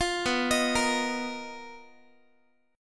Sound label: B Major 7th Flat 5th B down Guitar; arpeggiated